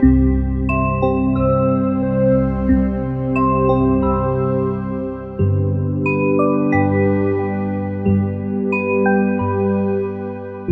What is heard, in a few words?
Shop Space Casual Theme (Looped)